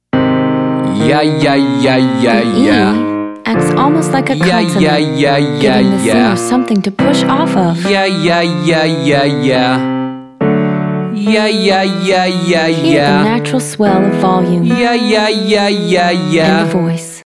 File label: Exercise 5 Yeah Yeah Yeah male